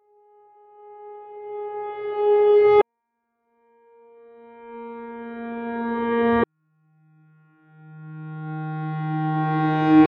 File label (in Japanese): ピアノ 逆再生 連続